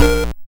YM Chord A#; ChipShop Deluxe; ChipShop Hits

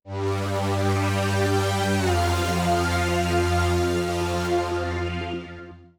Tech Synth 120 bpm, Synths; musicradar

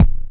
Kick, techno drum

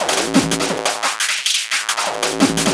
PO Combo G#m, 175 BPM; loops; CHIPSHOP DELUXE

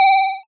Ym Multi Organ G2; multi-samples; CHIPSHOP DELUXE

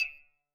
Tech Drum Hit, techno 120bpm

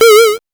PO Synth A#; SYNTHS; CHIPSHOP DELUXE; CHIPSHOP HITS

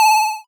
Ym Multi Organ A2; multi-samples; CHIPSHOP DELUXE

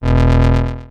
Rough Pulse, techno drum fx; Single Hits

